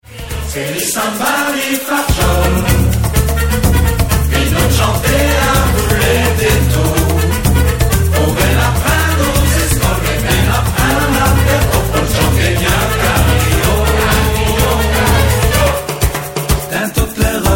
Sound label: Samba